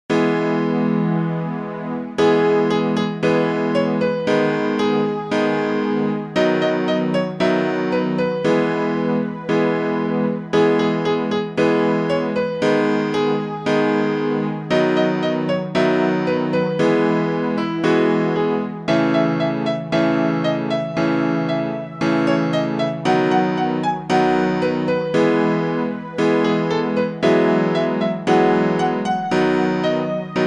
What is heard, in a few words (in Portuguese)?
dancando forro piano; quentao